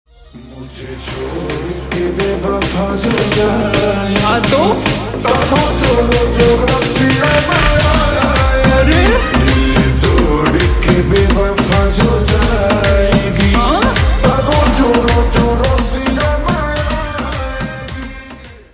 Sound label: Slowed Reverb Ringtone